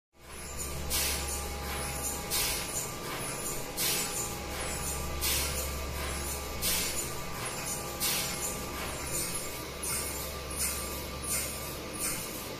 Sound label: quantum computer beat